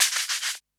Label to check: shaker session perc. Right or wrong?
Right